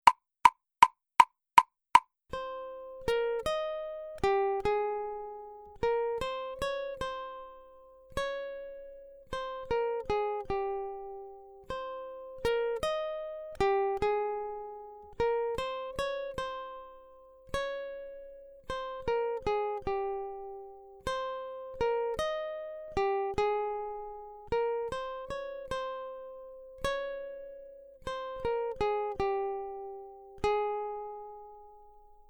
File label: Accompaniment, ukulele; grade8